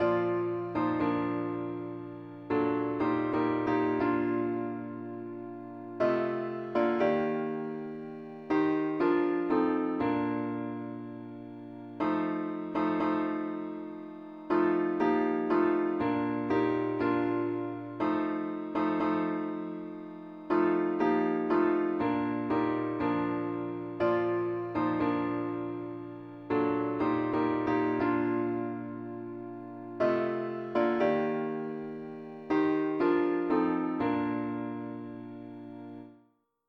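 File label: parts only keyboard; pilot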